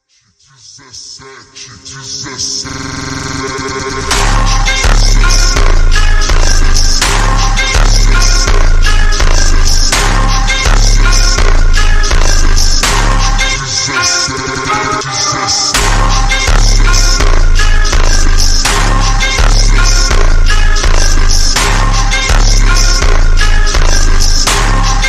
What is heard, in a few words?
FUNK super slowed phonk